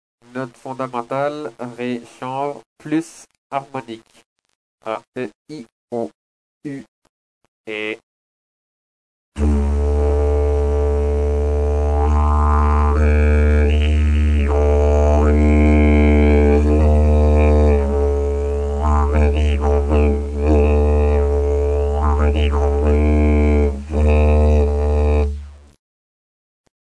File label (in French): harmonique